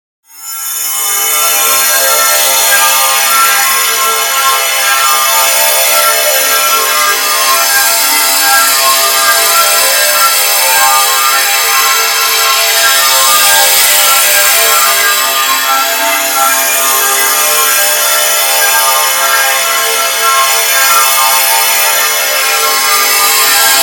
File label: Drone Sparkle Shimmer Shine Swells; 5.1 STEREO MIX; Blastwave